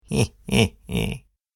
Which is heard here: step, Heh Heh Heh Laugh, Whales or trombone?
Heh Heh Heh Laugh